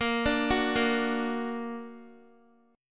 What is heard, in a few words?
A sharp Augmented Guitar; arpeggiated; tuning 4E,4A,5D,5G,5C,6F